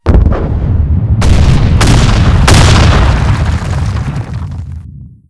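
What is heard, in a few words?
explosion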